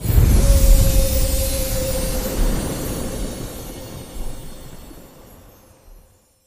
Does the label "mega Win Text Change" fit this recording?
Yes